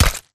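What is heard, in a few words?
impact bullet generic headshot human; Titanfall 2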